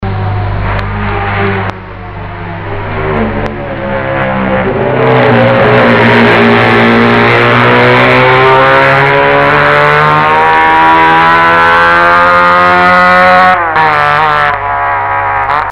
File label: 250 Desmo Duke